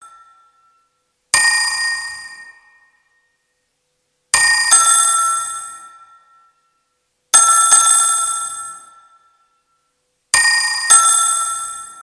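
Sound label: effect of phone ringing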